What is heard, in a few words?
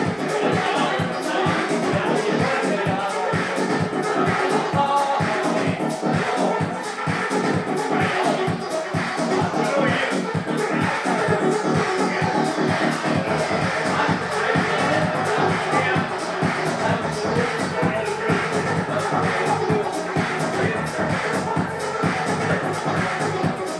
pow wow augsburg